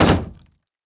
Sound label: door impact wood; Rust